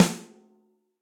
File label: Snare & Press Deep Snare Dynamics; Drum Hits; Ableton; Factory Packs; Session Drums Club; MPE Hits